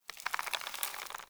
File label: Squid Plant Snore; Objects; Traps